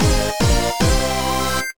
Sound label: Jingle Level up; Monster Racers; ds